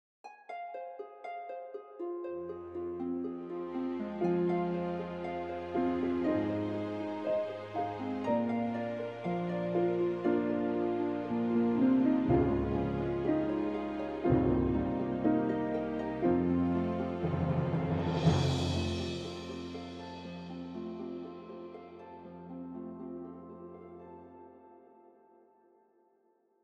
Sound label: high fantasy intro; The Voices of Tyr: High Fantasy (Intro); A mysterious, orchestral intro track for the Voices of Tyr